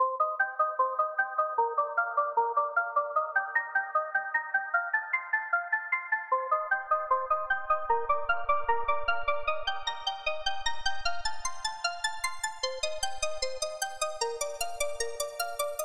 fm beispiel; Retrologue 2

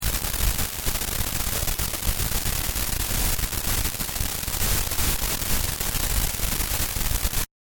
rauschen; fast track pro rode nt5

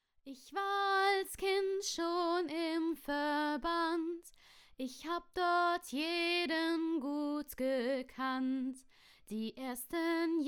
gesang; Wavearts Tube Saturator

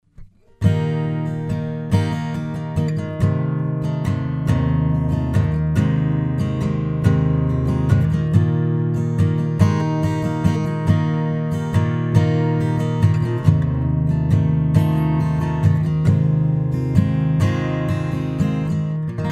sidechain, Akustikgitarre

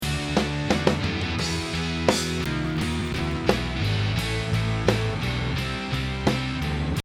fuerchterliches leiern

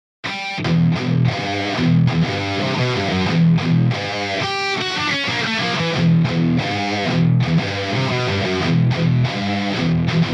metal, gitarren

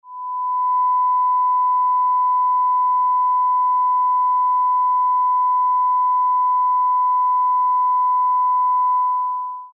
sinuston; Wavearts Tube Saturator

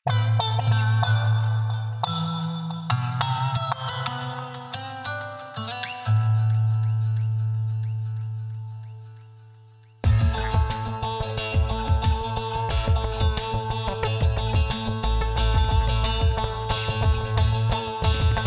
3 spur gitarre bass 303 solo

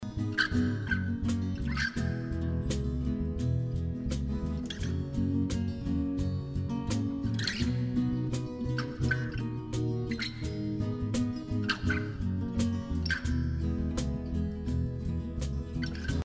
Gitarren quietschen, Westergitarrensaiten

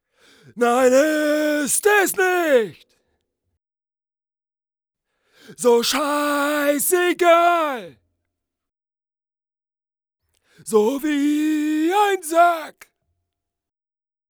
stadion punkrock chor

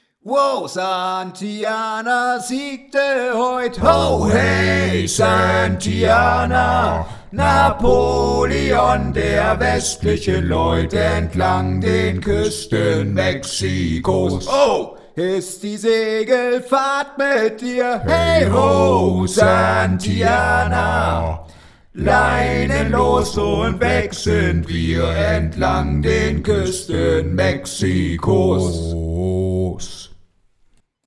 letzter basston anders, Shanty

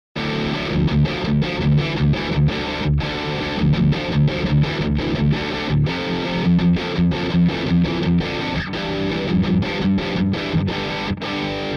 Gitarre

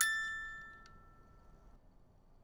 Spieluhr A5

